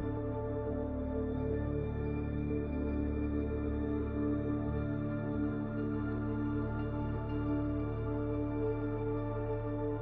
Guard looking loop; Stolen Steel

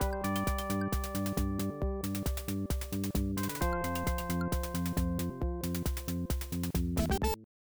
Teleporting; Teleporting to Morph Planet; Flashback; IBM PC AT

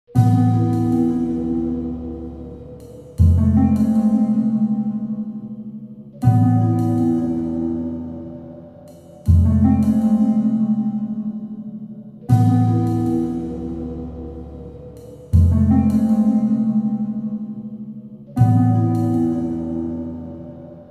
bus stop music; Hector Episode 1 We Negotiate With Terrorists

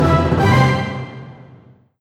Combo; SFX Ingame Combo 3

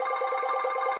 Gems count Loop

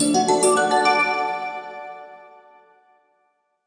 achievement; Bird Mania Party; wii u

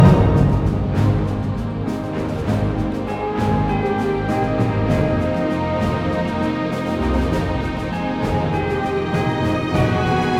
Full Menu Loop

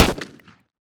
Shotgun Shot

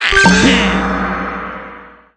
Sprinkleshell Charged

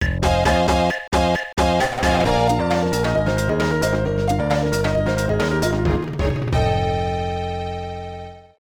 Race Finish; Armadillo Racing; Namco System Super 22

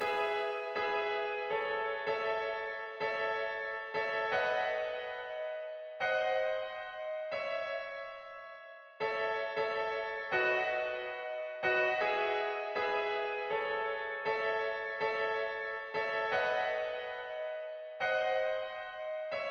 Town Piano; backpack hero gamerip 2022

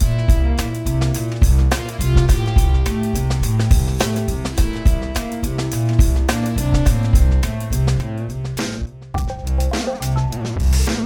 Groove; paper monsters; Drag n Dash

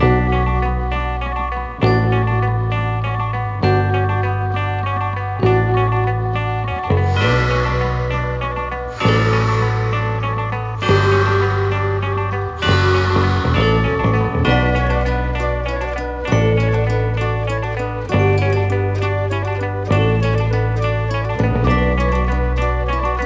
Xmas Bells; bully scholarship edition; Rudy Mid

